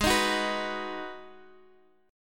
B Major G sharp; B/G#; strummed; JGuitar

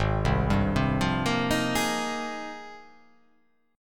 Ab Major 13th, AbM13 chord; arpeggiated; JGuitar

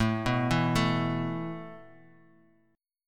A Suspended 2nd, Asus2 chord; arpeggiated

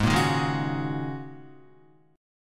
G sharp 7th Suspended 2nd Suspended 4th, Guitar; G#7sus2sus4 strummed; JGuitar

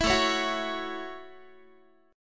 A 6th A Guitar, chord; 19,17,16,16,17,x; 4A,4E,5A,5D,5G#,6C#; strummed

